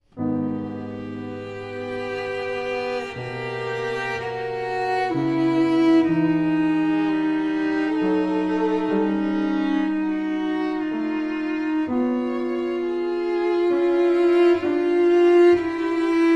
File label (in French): trio avec piano